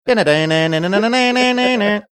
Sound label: singing portion